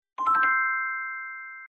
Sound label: Chimes Chord; China Mobile; A3s